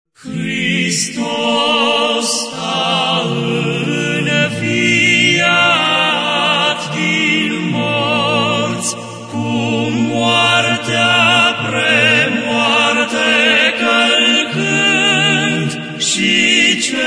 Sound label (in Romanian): voice hristos a inviat